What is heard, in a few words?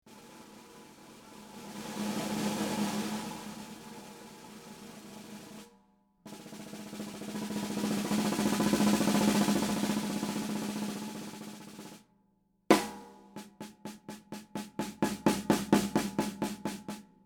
Crav Hybrid Euro Beech Walnut, 6.5x14; 09 NAMM